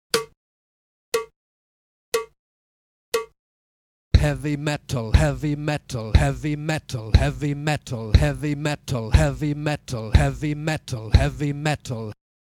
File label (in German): Uebung, schlagzeug